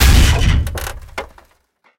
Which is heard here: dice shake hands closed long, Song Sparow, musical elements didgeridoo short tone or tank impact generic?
tank impact generic